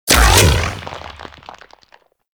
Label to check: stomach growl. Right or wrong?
Wrong